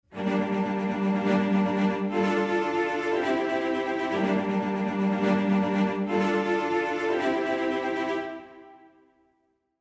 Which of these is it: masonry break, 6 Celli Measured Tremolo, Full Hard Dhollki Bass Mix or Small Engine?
6 Celli Measured Tremolo